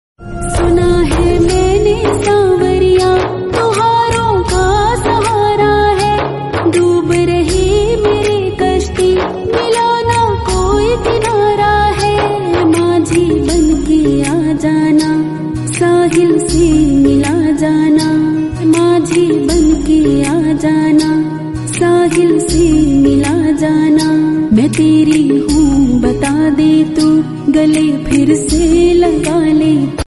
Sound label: hindi bhajan